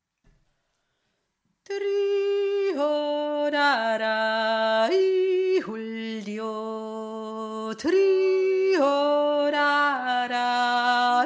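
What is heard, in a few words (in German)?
erste stimme, jodler; aberseer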